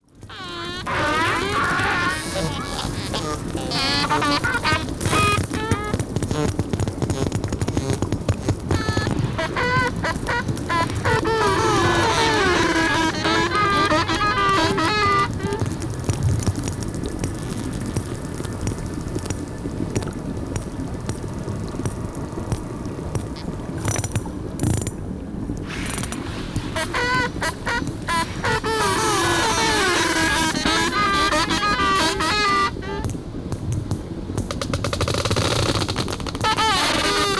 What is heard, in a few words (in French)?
dauphin